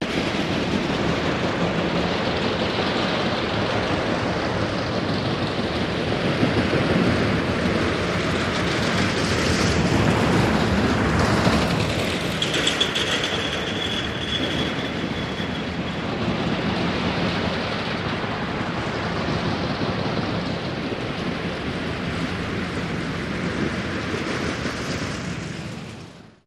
Roller Coaster Bys And Steady

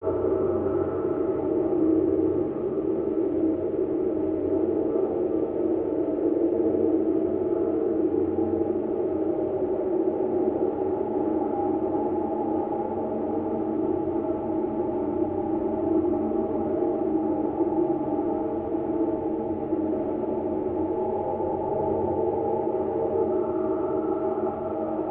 Ambiance Tonal, drones & tones

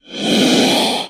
Cobra Indian Hiss Clo, snakes

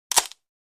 Bone Break Single

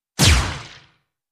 Wet Med Laser Zap, zaps

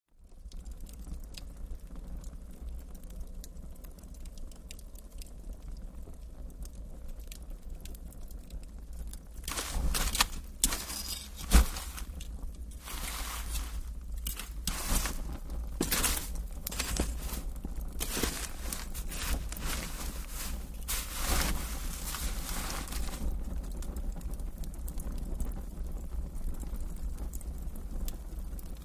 Fireplace Stirred with Poker. Fire Burn.

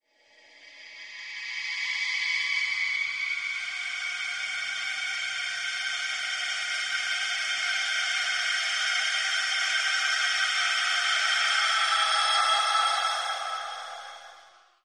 Horror Atmos Piercing

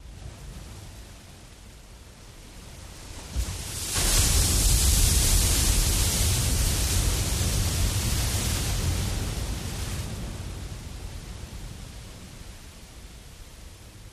Wave Crash Single, waves & surf

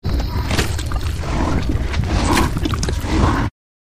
Monster Combine, monsters

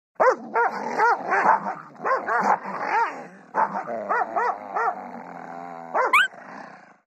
Dogs 2 Dogs Barking Gr

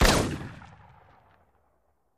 Machine Gun Single Rapid Burst Fire

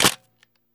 35mm Camera Shutter; shutters & movement

